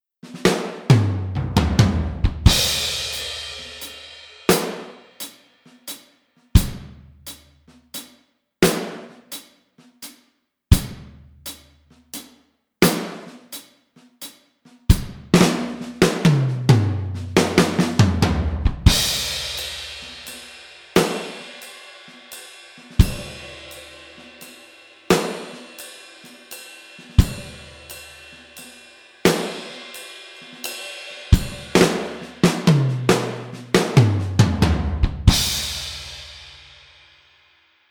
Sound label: Big Ballad; SUPERIOR 2; Hit Factory